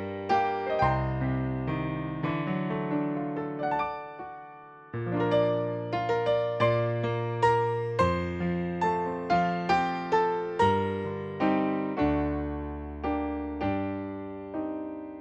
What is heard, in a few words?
Piano